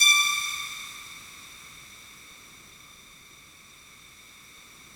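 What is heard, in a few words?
FOG ATTACK D5 Left, Yamaha VP-1; Music Store; 90's Sample CDs; FOGATTACK 3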